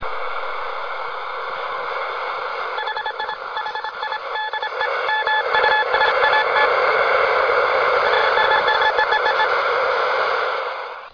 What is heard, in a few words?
sat msg; mu sat; hihidemusat